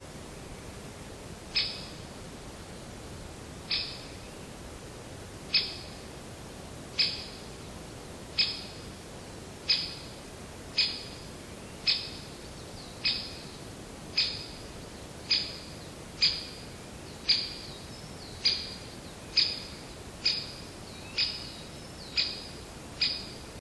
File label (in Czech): Strakapoud Velky; poznvme bory